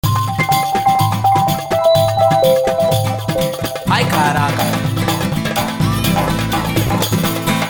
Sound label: World samba, ringtones; Space-Phone-mCell-5G